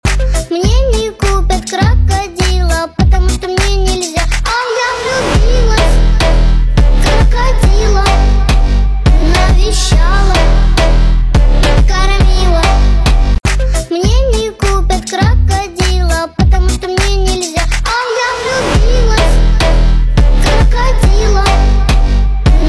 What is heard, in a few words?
Phonk